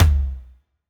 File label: Tom, 909 Toms; Slate; Vintage Drum Machines Volume 3 909 Edition